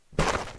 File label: goat digg; minecraft; technic